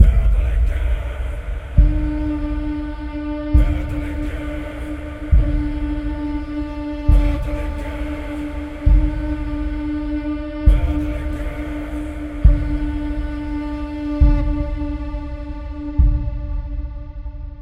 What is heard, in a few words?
Combat Start Combat Filtered; Hellblade Senua's Sacrifice; Bridge